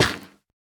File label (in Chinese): 菌岩 破坏声